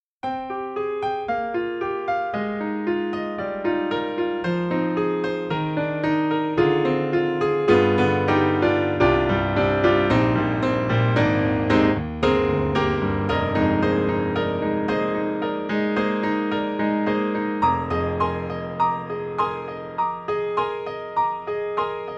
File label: Italian Grand, Side Mic; VI Labs; True Keys